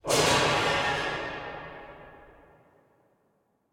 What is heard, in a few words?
Buildings Rumble Corridor Metal Piece Hit; Bert Foley; Noisy Buildings V1